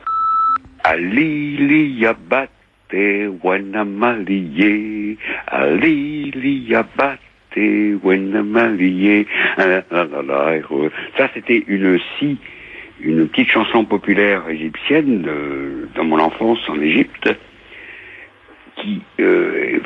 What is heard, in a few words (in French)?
Chanson anti coloniale, repondeur